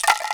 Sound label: RATTLE, INDIAN PERC